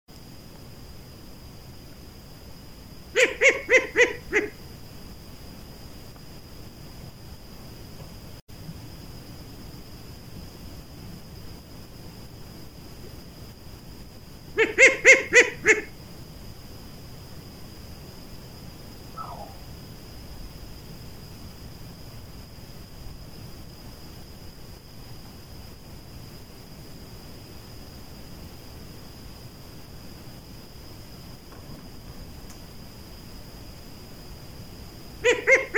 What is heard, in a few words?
Short eared Owl